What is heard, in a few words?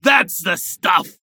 Bar Cheering When Somebody Orders Drinks